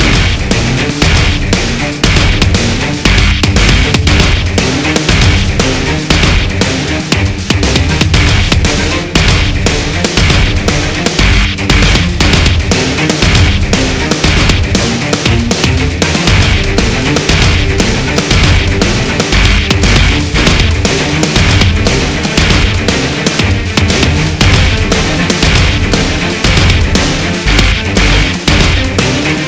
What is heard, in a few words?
Hammerstrike Fort High Action; modern combat 4 zero hour; android